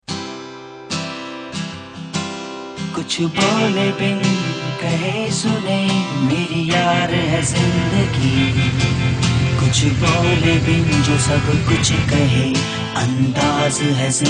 Ad Song; Passion Pro

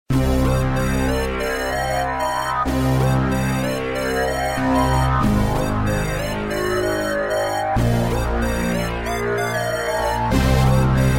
Hip Hop Beat

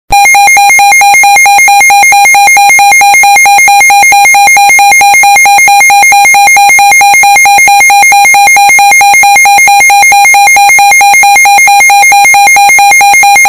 Midland WR-120

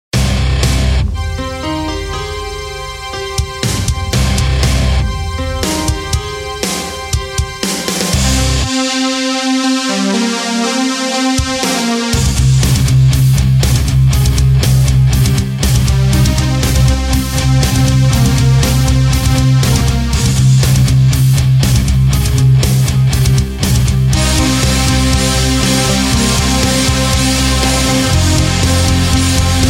metal

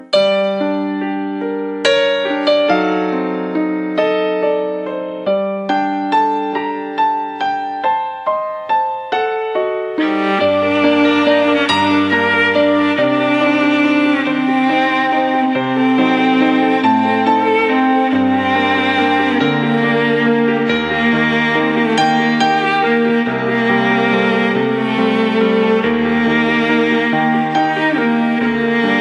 Guitar Sad Tone

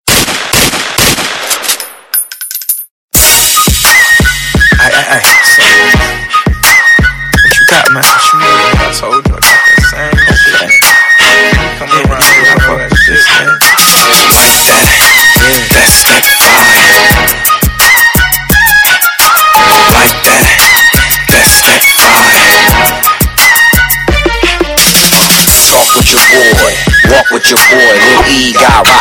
Best Bass Beat

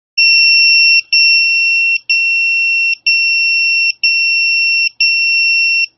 Pager Tone

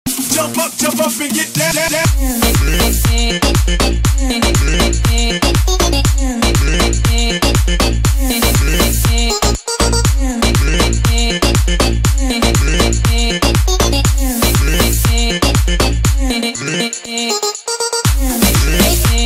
Jump Up

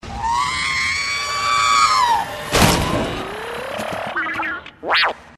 R2d2 Scream

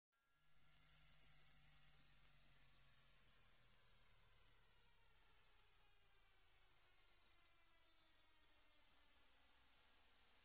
16000 Mhz Mix Tone

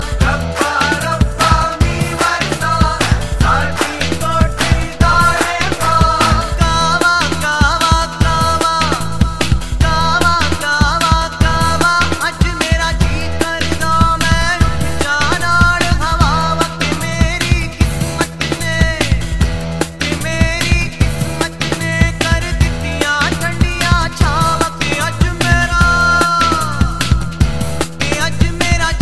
Hard Core Remix Ring

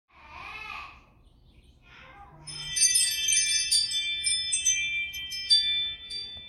Broken glass

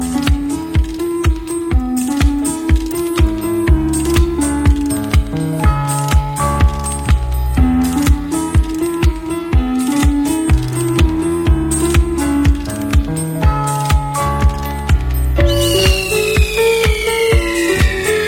Disco

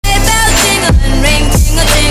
Sleigh bells

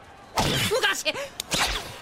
weird noise; Hinata

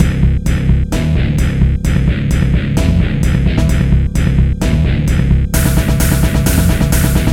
Grindcore

